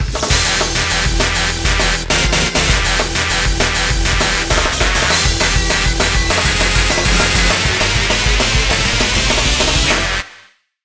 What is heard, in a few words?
Dramatic Countdown; 10 Second Run Returns